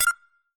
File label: click